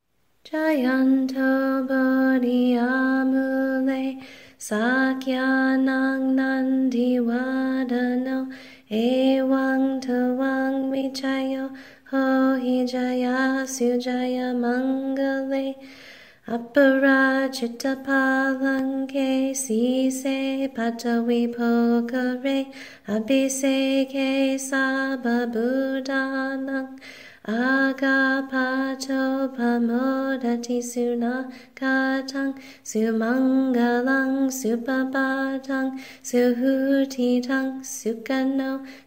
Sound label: victory chanting; jayanto